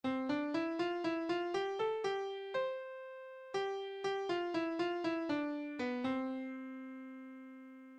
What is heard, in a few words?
Syncopation